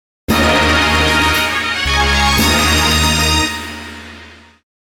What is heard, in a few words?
Fanfare; Midori 7